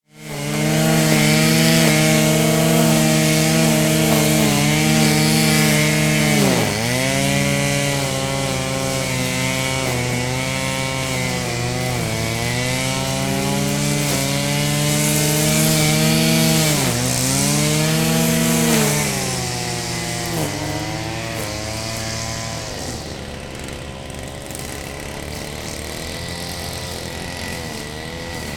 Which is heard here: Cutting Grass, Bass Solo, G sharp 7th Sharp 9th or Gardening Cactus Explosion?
Cutting Grass